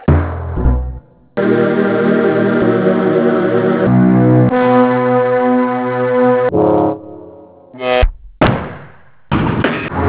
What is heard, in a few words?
raw music samples; Pinocchio 1995 SNES; gamesoundopedia